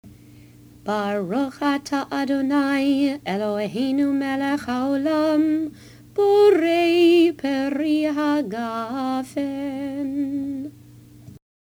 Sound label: Boray Pree Hagafen